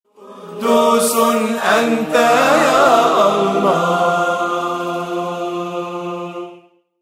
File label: Notification